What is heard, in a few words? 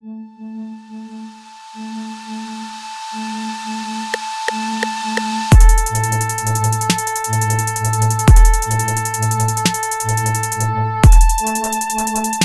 Horns and Beats